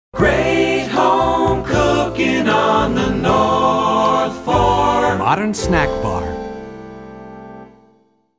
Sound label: jingle; modern snack bar; end only